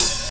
raw open high hat sample f key, Royality free open high-hat tuned to the F note